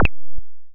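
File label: cancel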